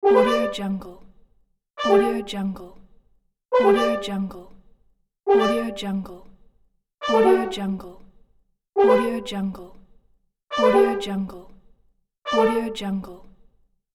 digital brass alert

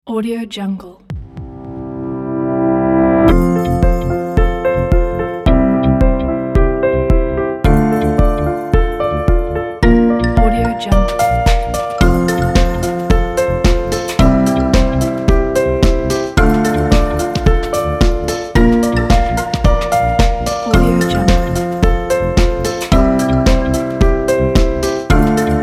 uplifting corporate

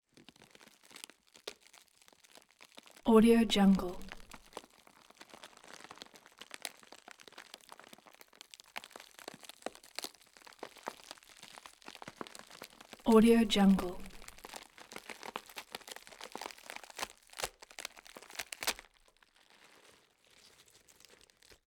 gore slow rip; collanderson

